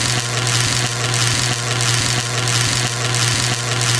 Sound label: lightning exp, weapons